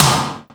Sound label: Snares; CMI